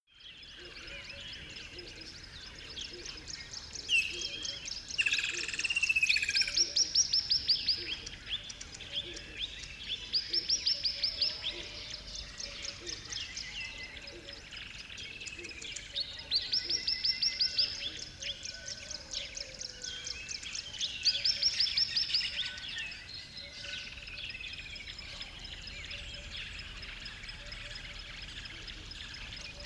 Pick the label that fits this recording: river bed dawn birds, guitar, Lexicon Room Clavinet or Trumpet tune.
river bed dawn birds